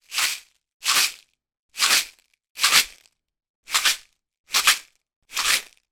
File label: food cake sugar silver balls shake in container; sound effects one